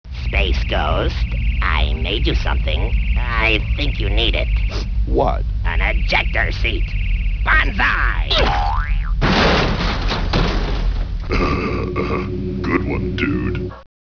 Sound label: ejector